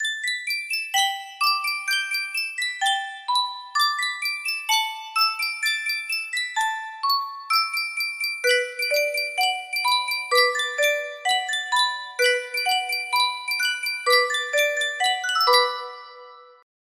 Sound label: sankyo music box